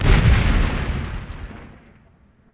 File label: Explosion; Civ4 warlords